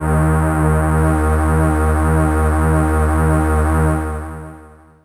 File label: ensemble, Fairlight, d#2